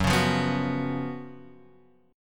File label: F sharp Major 7th Suspended 4th F sharp, Guitar Open F; strummed; x,1,1,0,2,1